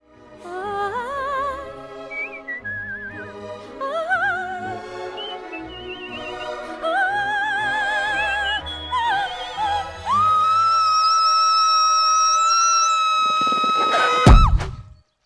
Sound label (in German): Knall; Shrek